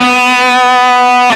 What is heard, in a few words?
Left-hand vibrato; osu